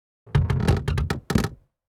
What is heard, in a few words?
Wood short and heavy creak earthquake designed